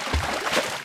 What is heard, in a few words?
slosh, footsteps, survivor, run; player; source engine; left 4 dead 2